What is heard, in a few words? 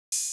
Drum Loop 140 BPM Open Hat, Drill; Loop Stems; Cymatics; GHOST (Beta Pack); Brute Drum Loop